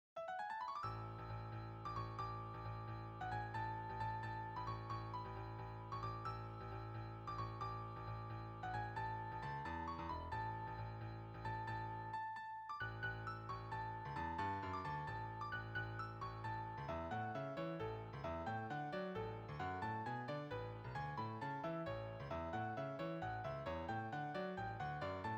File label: Melody Bass